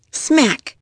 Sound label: SMACK